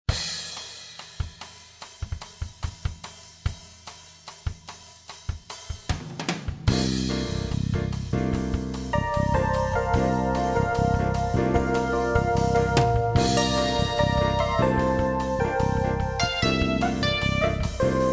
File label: light jazz